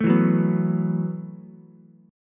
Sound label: E Suspended 2nd Suspended 4th E, Ukulele; strummed; 2,4,2,2; JGuitar